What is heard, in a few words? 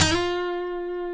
G, funk guitars; Spectrasonics; Hans Zimmer Guitars VOL-1; TAKA FUNK